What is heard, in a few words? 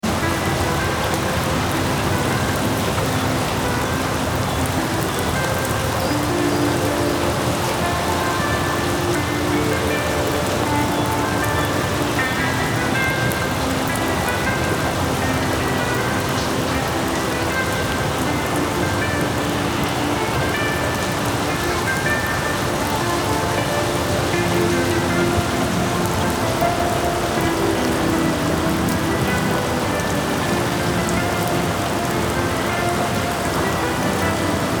Study Music Sounds Ocean Sounds Alpha Brain Waves